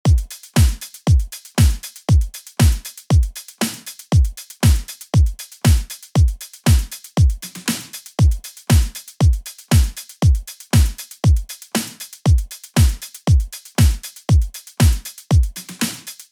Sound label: Drum with 3 different samples; Deeplastik